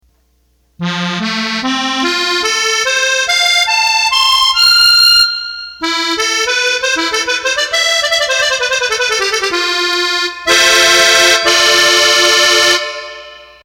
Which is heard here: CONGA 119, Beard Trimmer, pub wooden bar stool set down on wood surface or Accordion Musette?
Accordion Musette